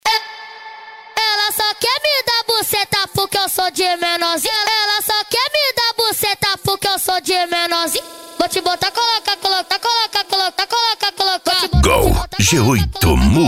funk ritmado

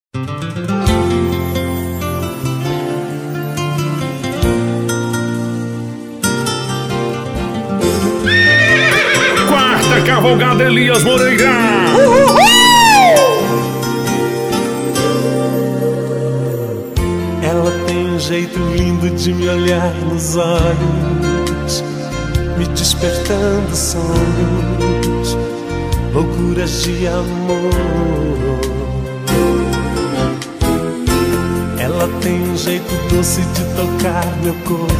sertanejo classico

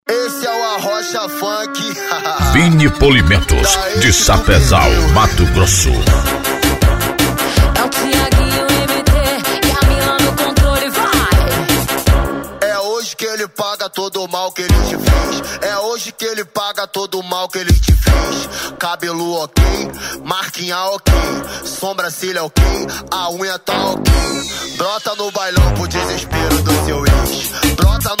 funk e rap

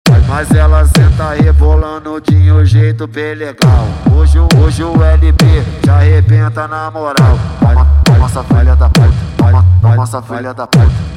especial funk bass

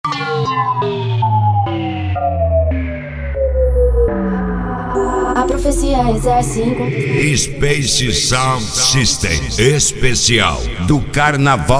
rave; space sound system; esp do carnaval pra rave

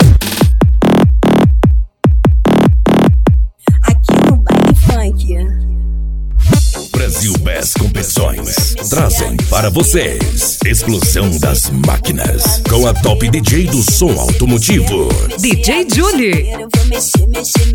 explosao das maquinas bass

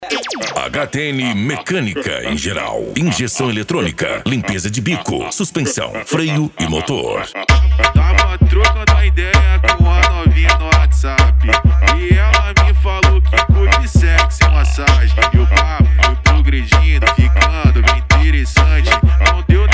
funk e lambadao

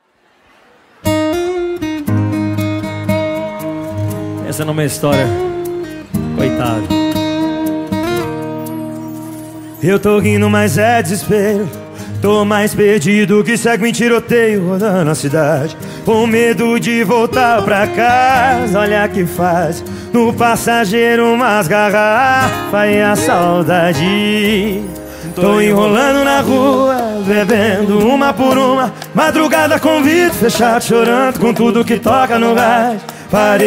funk e sertanejo

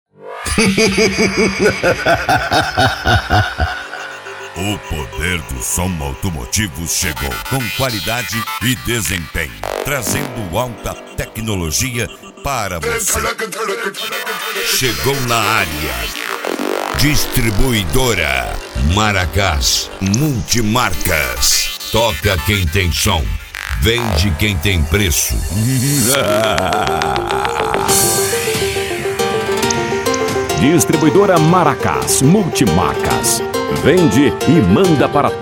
abertura; maracas multimarcas vol 03; cd distribuidora maracas vol 03

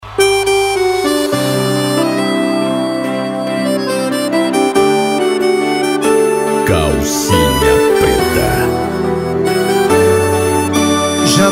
sertanejo e pisadinha; cd volks sound car

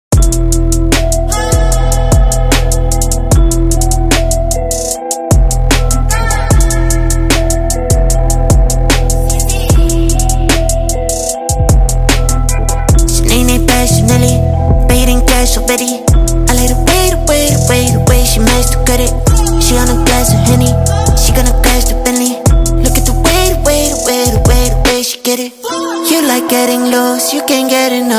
bass

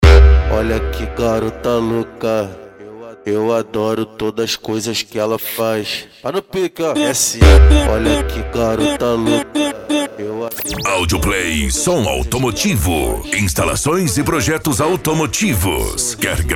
esp funk mega bass

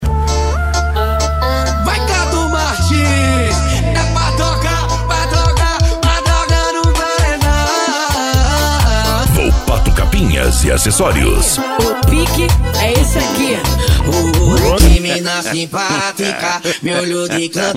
funk consciente e hip hop

